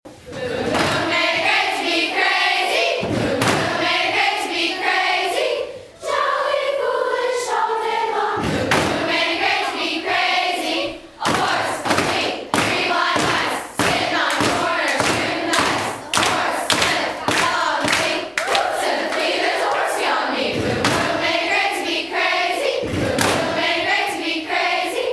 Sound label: boom boom